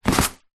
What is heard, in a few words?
container with dry rice inside set down on wooden counter; zapsplat; foley